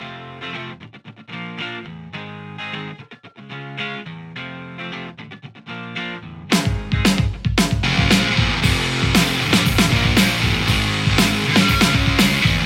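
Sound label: Stratocaster; rcvprod amplistortion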